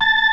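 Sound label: A5 fast power, power B3; Northstar - New Gold VOL-1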